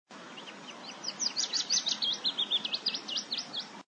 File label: Willow Warbler